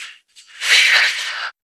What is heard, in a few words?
jingle